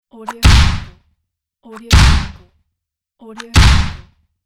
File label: stamp of approval